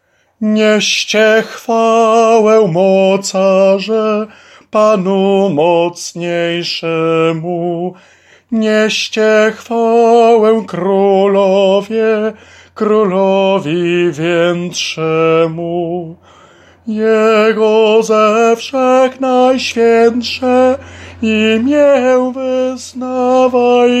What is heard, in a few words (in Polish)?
SOPRAN; Niescie chwale